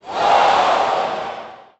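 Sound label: Boxing Crowd Large Reaction; Crowds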